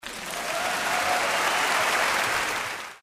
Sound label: clap